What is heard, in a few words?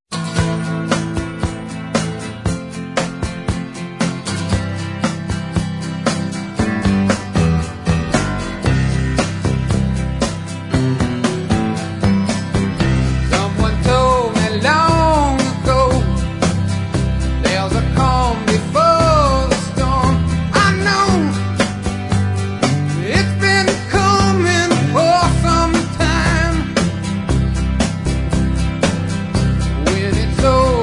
Rock Romantico